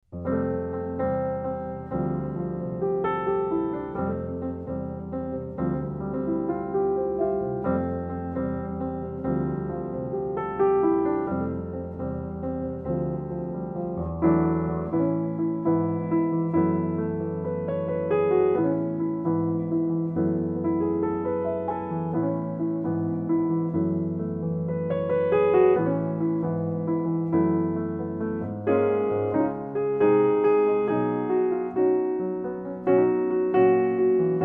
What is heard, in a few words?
Balloon Ride Solo Piano; Short Version